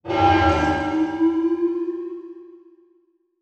Transition Hit Impact Long; Production Element

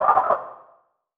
Multimedia Internet CD-Rom Flash Hi-Tech

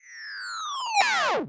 Science Fiction Sci-Fi Electronic Laser Beam

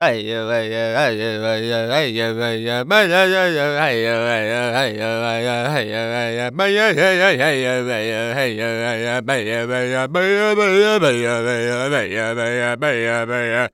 Human Male Native American War Chant

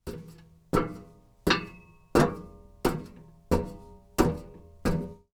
Human Crawling Through Metal Vent

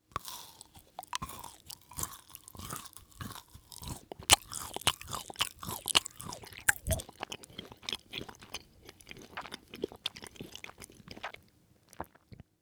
Human Male Chewing

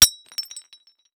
Impact Ceramic Plate Hits And Breaks On Concrete

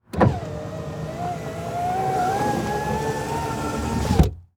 Truck Ford Ranger Roll Up Window Interior; transportation

